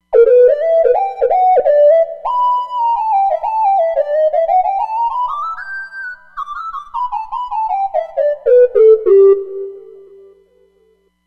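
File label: Square Lead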